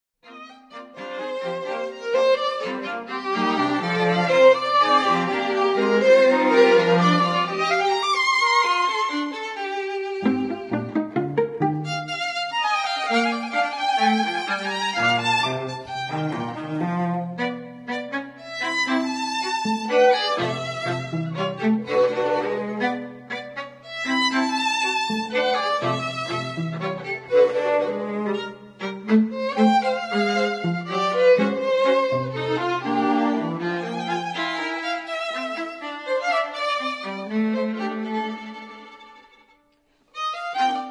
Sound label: string quartet 1st mvt